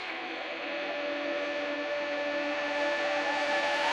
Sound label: thunder